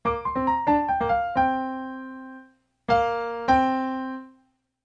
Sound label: Terrible Counterpoint